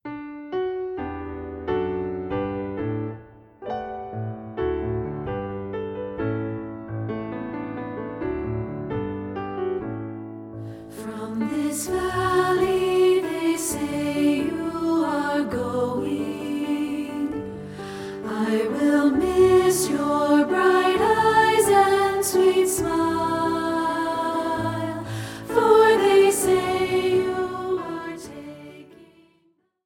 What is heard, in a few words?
SATB (Alto Dominant); Red River Valley